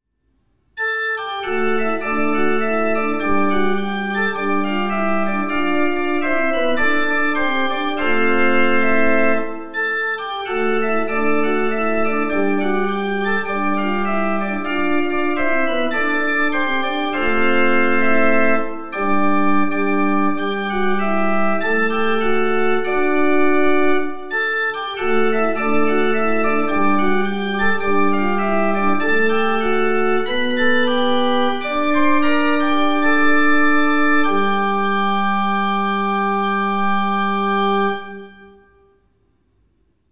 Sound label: organ